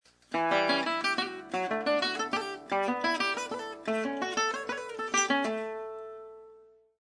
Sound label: major ascending sweep